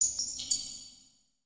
rats reverb; ambience ninjago

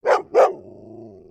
doberman bark; creatures pets

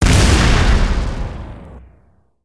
Spider Boss Fire Rain Impact

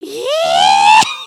cheer; emotes

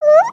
Kitty Cat Curious; creatures organic; Backpack

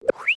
Frog Scared Head Up; creatures organic

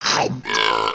Shark Bite; cinematics

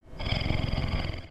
Kitty Cat Purring; creatures organic; aronwk collection; ndaudio

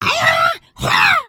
Sweep; creatures organic

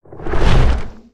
Spawn Flap; creatures organic; Burno

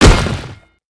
WPN Impact; creatures organic

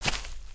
Footstep Grass; creatures pets

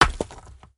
Pet Elephant Digs; creatures pets

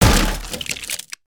Wood Splinter; destructibles

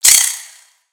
Spider Get Hit; creatures mechanical; Lego

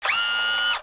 BroomBot servo; creatures mechanical

